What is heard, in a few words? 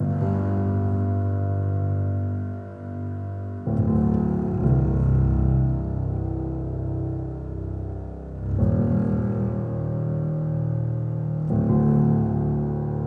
Music Level Fight Loop